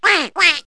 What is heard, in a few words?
QUACK; Wired for Sound Pro CD; Aristosoft, Inc.; animals